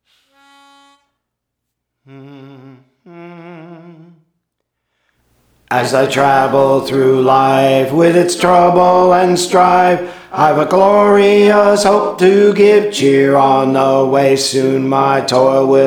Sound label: Bass, Praise For The Lord Training; Paradise Valley